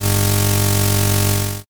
weld

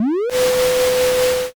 vacuum

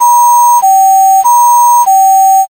ambulance

